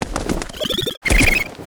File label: THORNASH WAR ATTACK